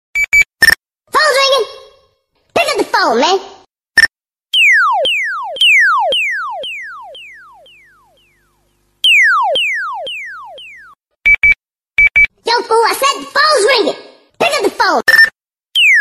chirp ringtone; nextel; android

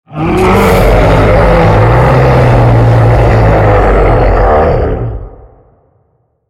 roar ringtone; rockwell

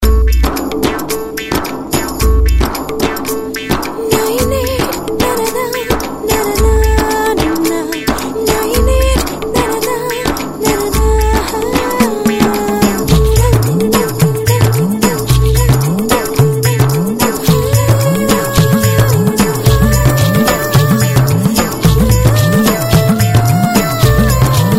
folk ringtone; idea

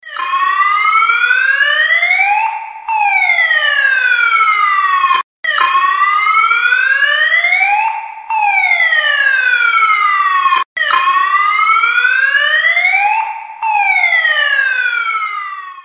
message alert ringtone

